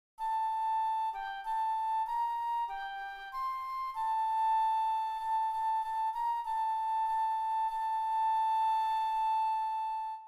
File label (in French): KYRIE FLUTE; St Jean